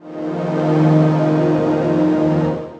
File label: Synth Note Rain D#